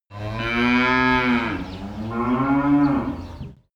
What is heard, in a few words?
cows moo barn